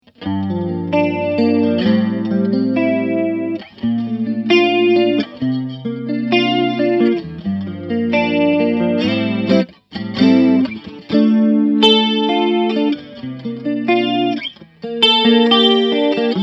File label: Chorus